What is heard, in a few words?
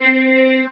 talk box choir ee